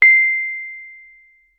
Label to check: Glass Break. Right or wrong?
Wrong